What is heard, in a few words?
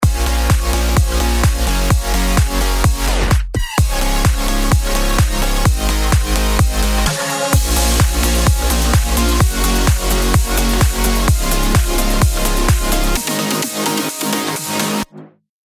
prog house